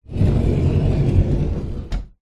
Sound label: household cupboard door wooden slide low frequency; zapsplat